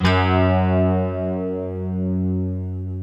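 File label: slow strings guitar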